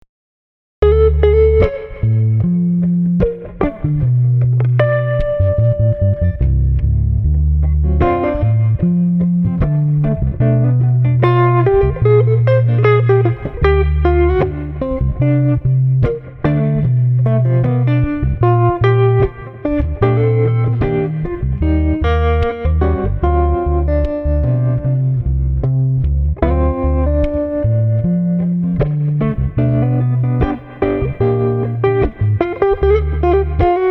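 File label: Street Guitar Bass Laidback Blues, 75 bpm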